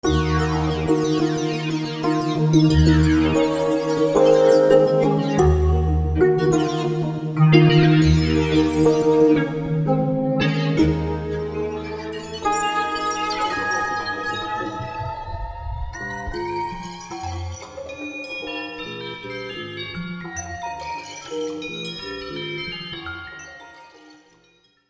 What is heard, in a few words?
Float Piano, V-Synth